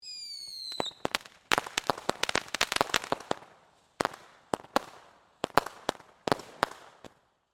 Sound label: Firework Whistles, Cracks, Pops; Fireworks